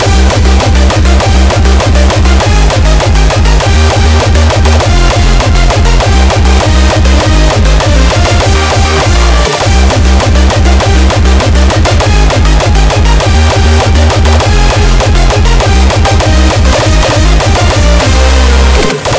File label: oldschool; cstrike